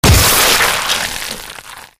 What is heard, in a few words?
grinder human, ambient; Garry's Mod; tf2